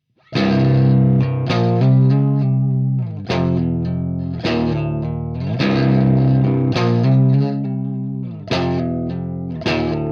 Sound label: GTR BYPASS